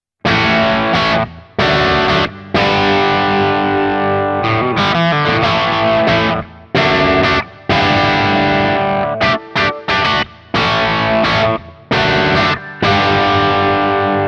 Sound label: Hagstrom Ultra Max Bridge Crunch Rhythm